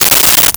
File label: Coins in Hand, Foley